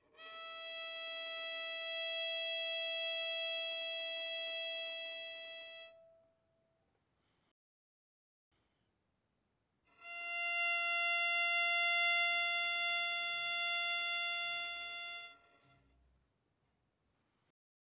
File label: Violin arco mf sul E E5 B5